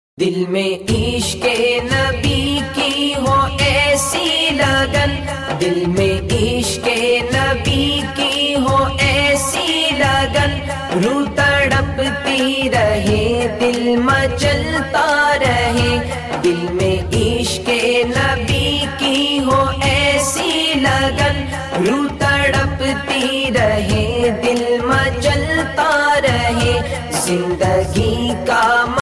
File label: islamic naat ringtone; islamic ringtones